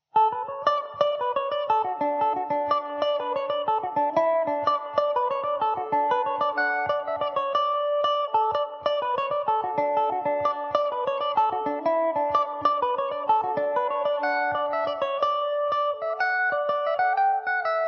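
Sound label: john ryans jig D major, irish mandolin; Mandobird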